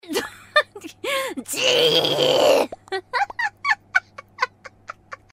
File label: polka